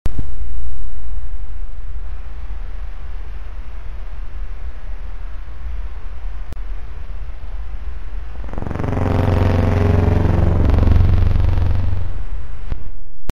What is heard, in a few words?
Signal